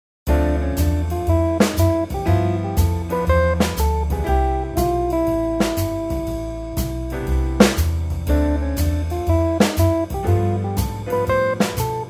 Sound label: Scale; Tomosax